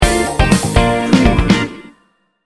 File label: sting bumper